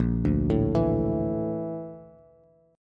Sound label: Bb Major Sharp 11th Bb down Bass Bass Standard, chord; arpeggiated; JGuitar